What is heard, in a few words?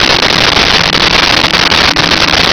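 pod cockpit telemetry loop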